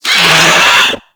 Infested Biped Pain; Warframe

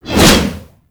Hammer Whoosh

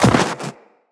Run Snow, Footsteps; Tenno

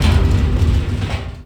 Metal Barrel Slide; Warframe; Ambience; Nineteen Ninety Nine

